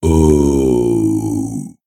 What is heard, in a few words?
Male Ogre Death